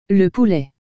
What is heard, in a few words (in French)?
poulet; les animaux